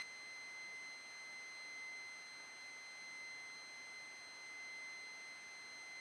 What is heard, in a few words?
Switching Supply